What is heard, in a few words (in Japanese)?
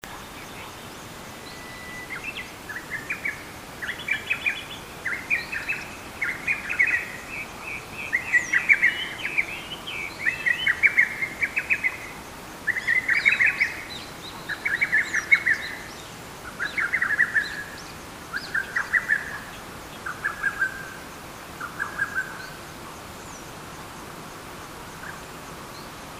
hototogisu